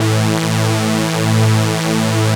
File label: saw lead synth; Ueberschall; Techno Trance Essentials